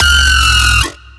Bass One Shot F#, Dubstep, Synths; One Shots; Cymatics; Dubstep Starter Pack

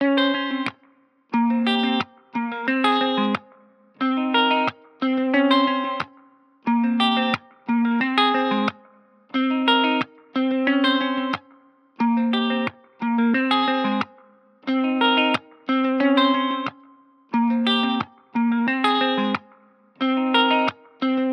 180 BPM F Min Guitar Arp; Loop Stems; Cymatics; Miami Guitar Pack - Pt 2

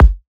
Kick Trap

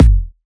Techno Kick

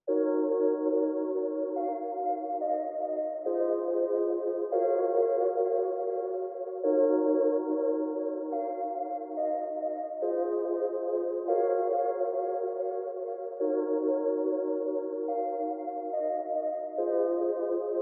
142 BPM A# Min Synth Keys; Melody; RnB; Loop Stems; Cymatics; 2022 Melody Collection - RnB Pt 2; Maybach

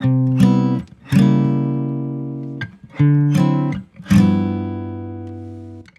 Acoustic Guitar Loop - 80 BPM C Maj; Lofi; Dusk; Cymatics; Lofi Starter Pack; Guitars

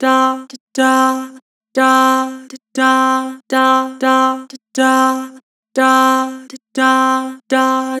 "Dah", Vocals; Harmor

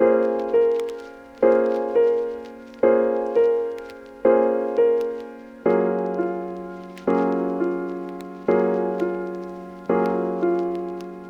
Classic Melody Loop 85 BPM A# Min Piano; Melodics; Lofi; Loop Stems; Cymatics; Eternity

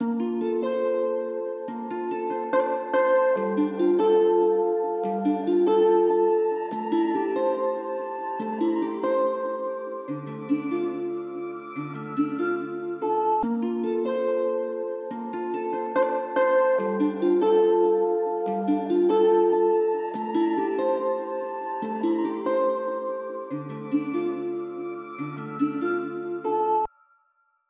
Nostalgic Melody Loop 143 BPM F Maj; Cymatics; Oracle Sample Pack

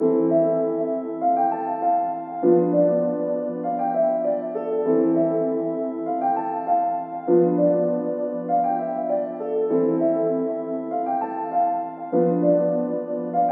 West Coast Melody Loop - 99 BPM A Min Ambient Pad; Melodies; Loop Stems; Cymatics; Oracle Sample Pack

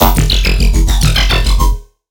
Riddim Bass Hit F#; Squirm

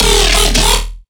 Riddim Bass Shot E; Dubstep; Riddim Basslines; Drill; Ghosthack; Advent Calendar 2019 Day 17; Riddim Bass Shots

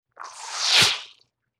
Wet Squoosh, Vintage Cartoon

